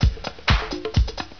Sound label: beat